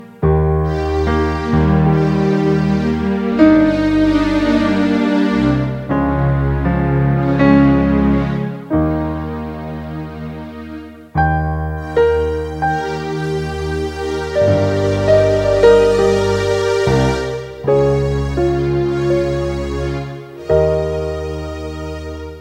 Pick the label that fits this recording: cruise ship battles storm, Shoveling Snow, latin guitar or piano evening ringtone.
piano evening ringtone